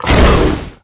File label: attack; dota; jakiro